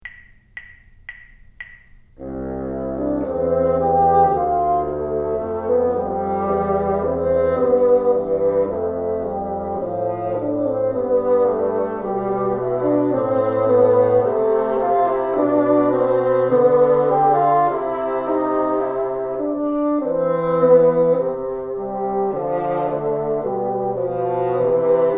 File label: together, bassoon; New Note